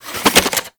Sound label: Fist Spin; Warframe; Weapons; Archwing; Melee; Regor Fists